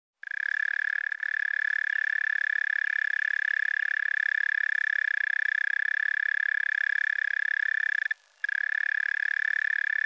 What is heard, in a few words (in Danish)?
jordkrebs